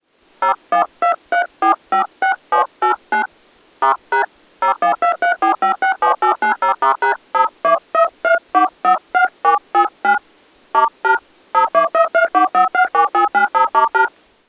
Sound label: combined dtmf tones